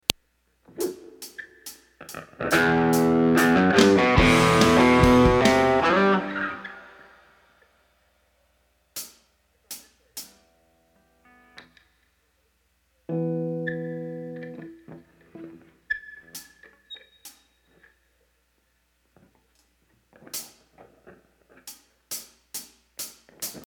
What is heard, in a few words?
Ambient Guitar Mix